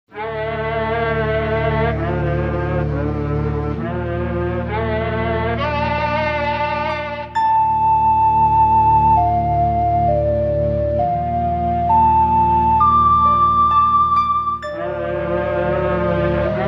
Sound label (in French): Ode de l'Appel; Majora's Mask